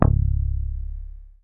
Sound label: MUTE C#1, Bass; Sampleheads; Will Lee Bass Library